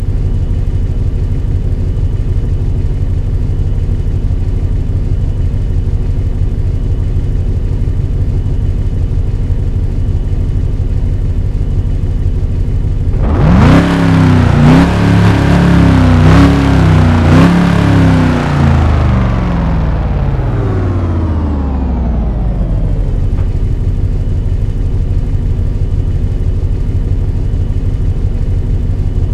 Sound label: idle, vehicles cars; bugatti veyron; lwcars